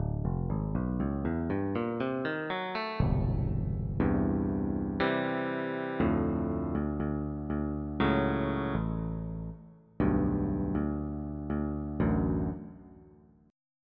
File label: Basic Guitar